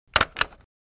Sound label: stapler